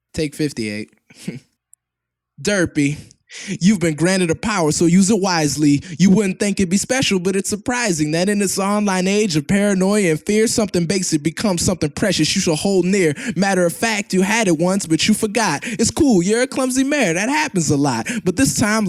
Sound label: The Superpower vocals only 97.5BPM; Acapellas